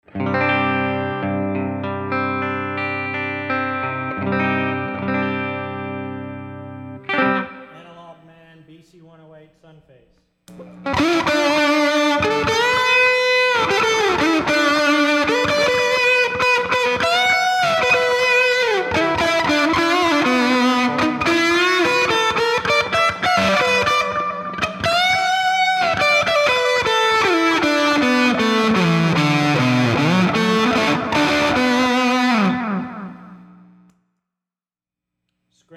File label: Sunface Lunar Module Helliphant Carbon Copy